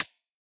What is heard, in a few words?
hats C, hip-hop drum kit